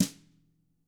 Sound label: TAM Double Platinum Drums; ILIO; Double Platinum Drums 2; TAMBKIT